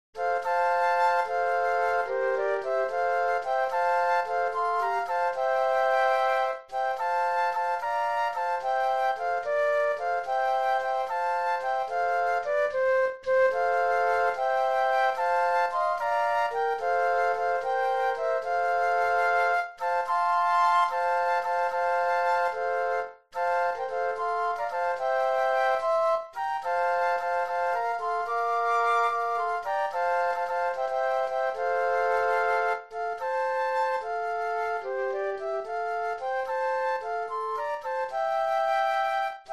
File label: Flute Trio